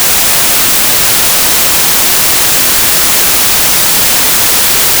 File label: white noise